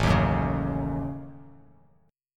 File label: A 6th Flat 5th, Bass Standard; A6b5; strummed; A6b5 chord; JGuitar